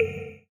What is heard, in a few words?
Clavas; Misc Percussion